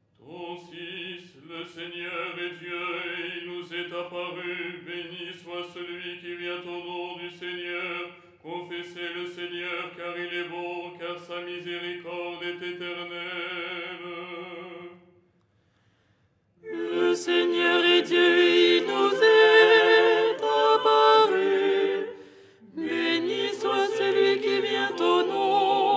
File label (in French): alto; " Le Seigneur est Dieu… "; Les 8 tons en français; ton 6